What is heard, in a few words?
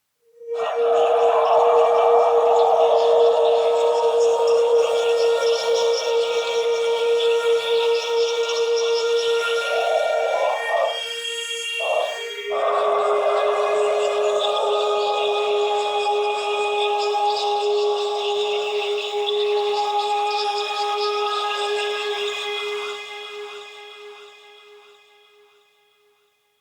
Sentient Choir; Sentients